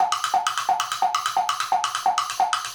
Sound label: Wood Block; Sonic Foundry (Sony Creative Software) - Essential Percussion